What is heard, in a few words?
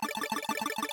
main count; astro Legends Lyra and Erion Desktop; Foxium